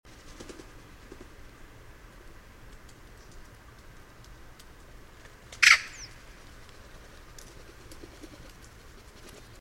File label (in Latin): Pica pica; SETE